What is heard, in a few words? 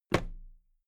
toolbox close drop lid soft